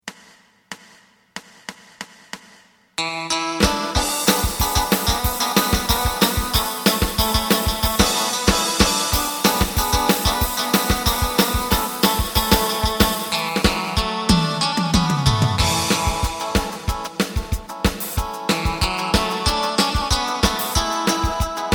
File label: bass